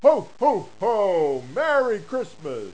HOHOHO

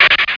skid; JOHNNY

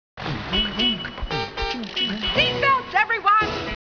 SEATBELT

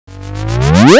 WHAH ZIP

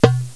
BOOM

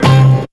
shot; BEAT2000

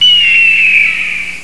EAGLE

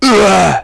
UGH; JOSEPHIN

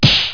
SPOON HIT; JOHNNY

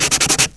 ERASER

